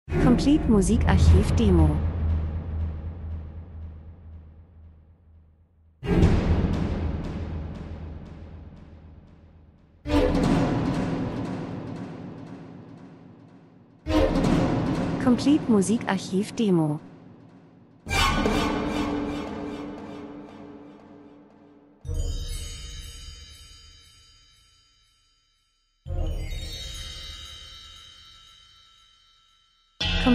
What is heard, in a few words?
drums; Timpani Orchester Percussion Horror Angst Aufmerksamkeit Nacht Friedhof Spannung Krimi Spielfilm TV